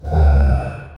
monster

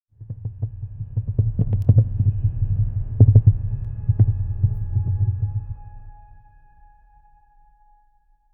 a massive medieval battle, battlefield

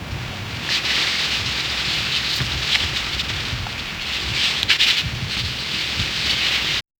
soft pencil scratching

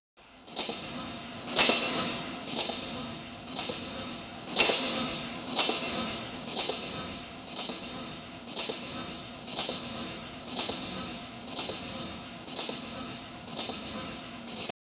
futuristic robotic assembly line

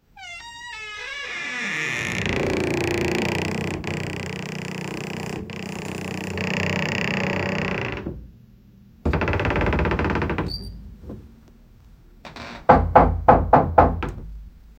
old door squaking

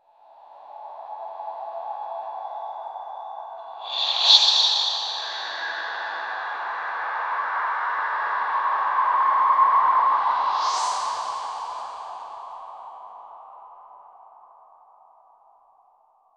whisper like transition; fashion

